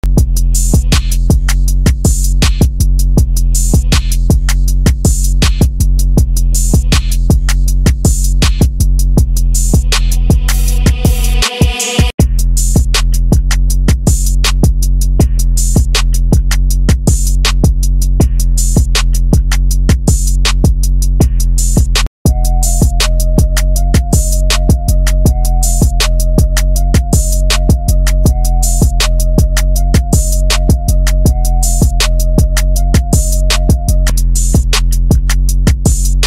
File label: Instrumental